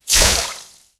dragon hiss with bubbling

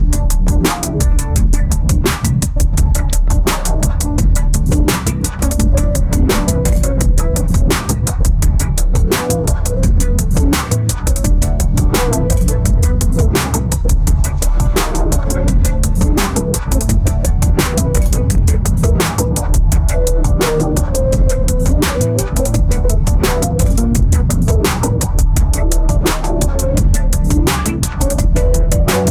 hip hop

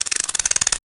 rotate the piece in game, puzzle

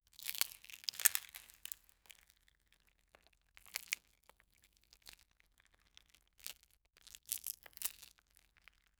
food eat crunch but soft

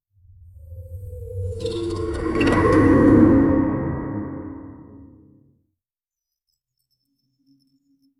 entering a cosmic portal

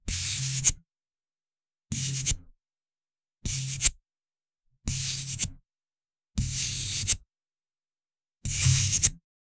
shhh shhh rubbing buff, material

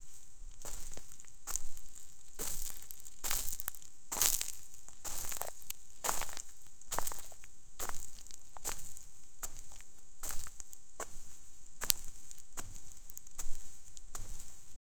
the sound of walking on, footsounds

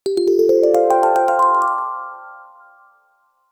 dialogue advance chime

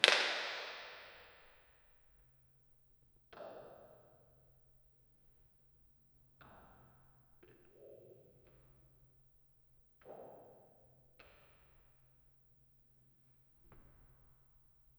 echo chamber

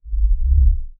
the sound of an energy, energy sphere

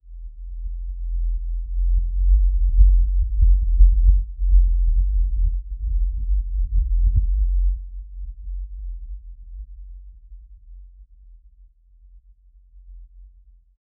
cosmic pulsation deep

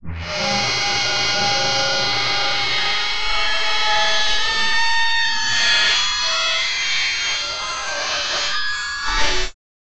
sound damage robot metal

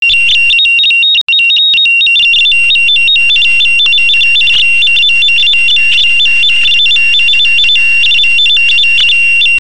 a retro sound like

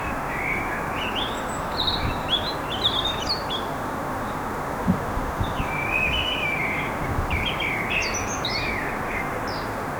the sounds of nature in, garden

